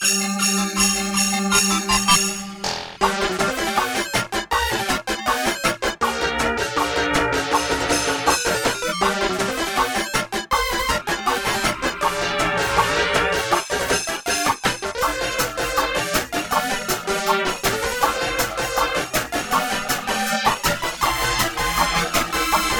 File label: Snowball Fight - Being Hit; Wii Fit Plus